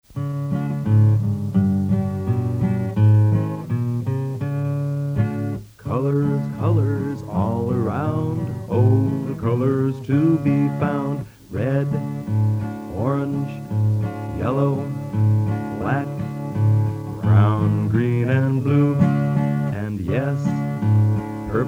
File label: Song 7 - Colors (Single Consonants and Blends)